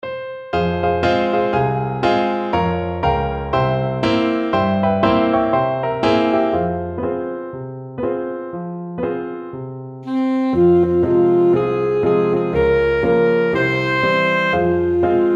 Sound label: low bridge, saxophone